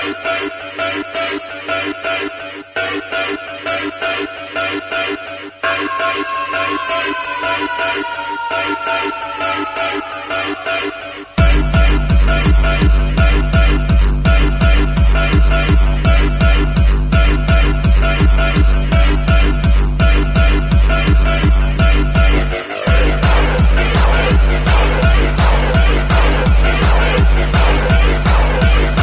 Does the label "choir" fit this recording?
No